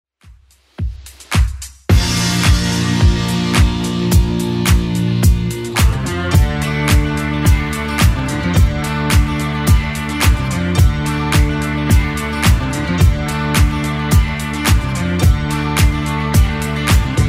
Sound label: 80s Club Edit 108bpm